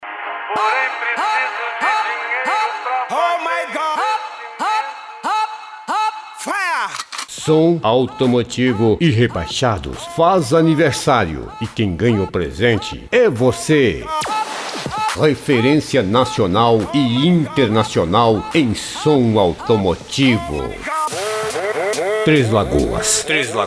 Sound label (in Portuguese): som automotivo; rebaixados